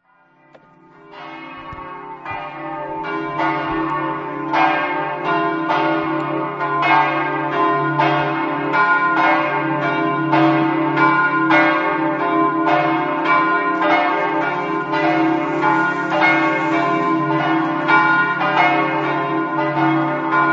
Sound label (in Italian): povoletto, campane